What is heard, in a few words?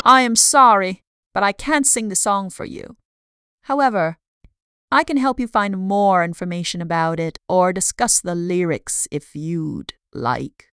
sing